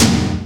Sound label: R8 Tom, Roland R-8